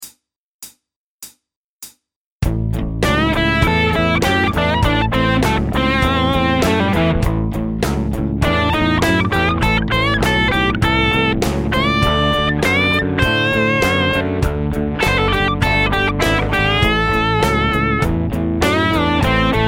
solo example, guitar

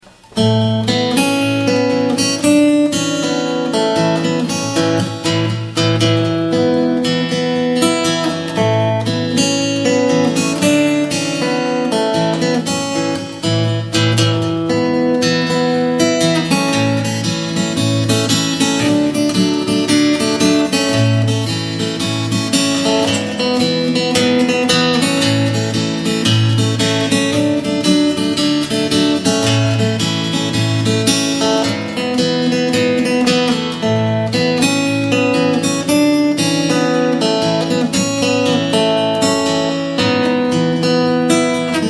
fingerstyle

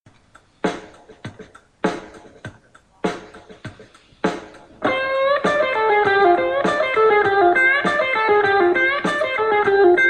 repeating lick over d minor

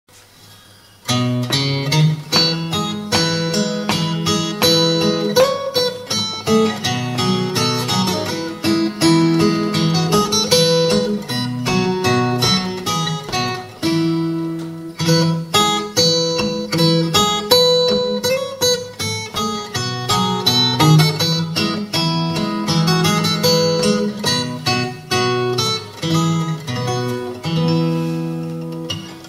this old man fingerstyle, guitar

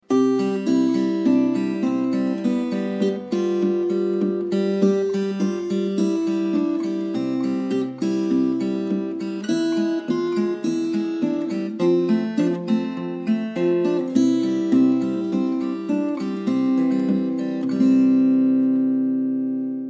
fingerstyle

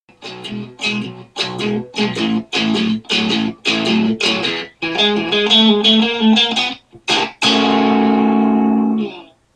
blues turnarounds lick, guitar

